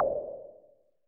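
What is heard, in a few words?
Nord noise, techno; Drums In Your FACE!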